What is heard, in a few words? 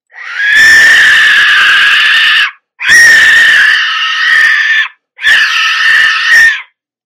whistle